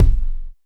rich lows kick single hit F key; kick drum samples

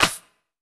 Bright Snare D Key; snare drum samples

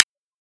smooth trap acoustic snare D key; snare drum samples

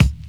hip hop kick A key; sample

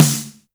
Small Reverb Snare Drum D Key; Duration 0:01; Mono

